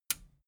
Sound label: metal button radio push disable